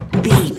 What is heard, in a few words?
door old wooden close thud